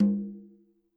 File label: CL m, KILLER CONGA